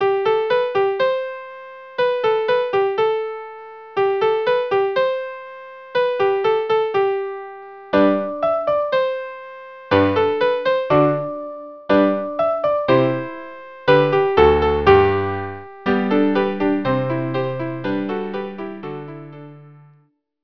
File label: quartet